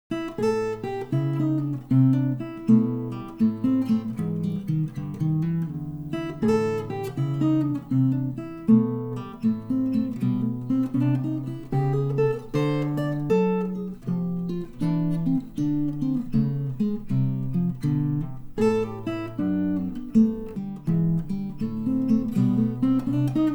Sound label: blues